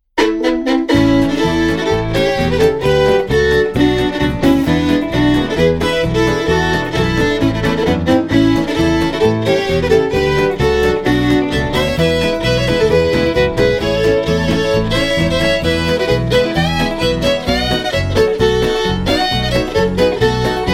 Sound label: Skiffle Fiddle